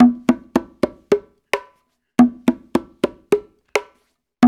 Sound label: 108 TONGA, African Ethnic Drums; Spectrasonics; Supreme Beats